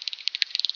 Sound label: pipistrel bat